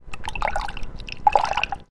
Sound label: water footstep; Camas